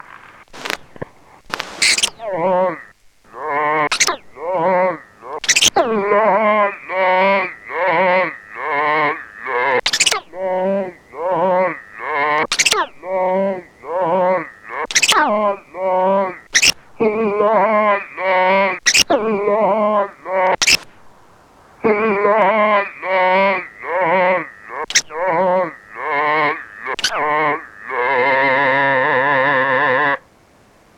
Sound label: Bad Operatic Voice Rewind